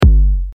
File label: Kick Master Slave Sidechain